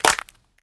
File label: subtle reverb clap A key